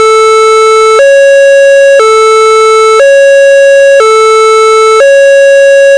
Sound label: tone